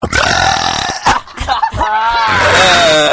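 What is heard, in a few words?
blaah, Farts & Belches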